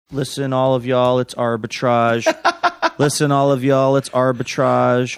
singing listen all of y'all it's arbitrage

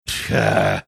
making funny scoffing sound

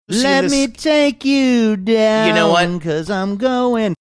singing let me take you down cuz i'm going

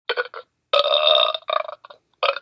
burping

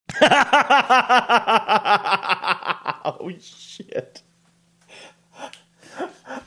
laughing saying oh shit

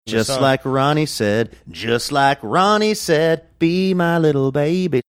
singing just like said

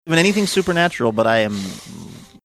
blowing nose without muting mic

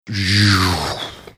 imitating sound made when spotify opens

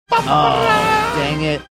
making trumpet sounds saying oh dang it

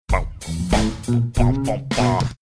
singing along funky bass blursday

